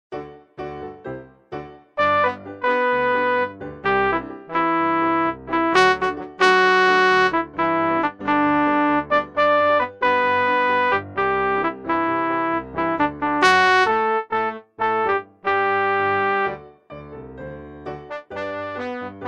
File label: SOP